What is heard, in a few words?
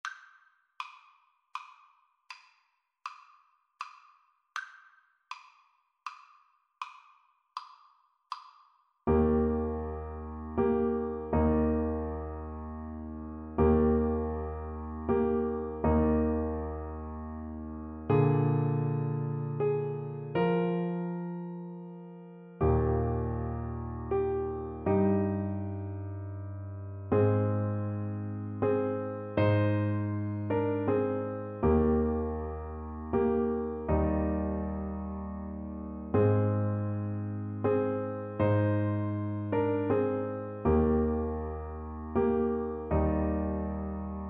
Soprano Saxophone